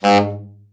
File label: BRA TENOR SN, SAX